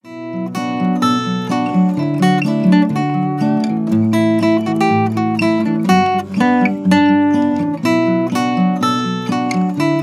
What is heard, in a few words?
gitara